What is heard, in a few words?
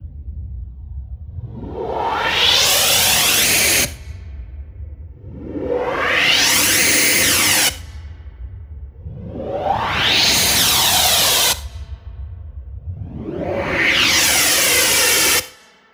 FX Uplifter 125bpm